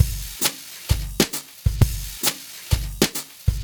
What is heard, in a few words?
Drum Swing Grv 132, Lounge Drumz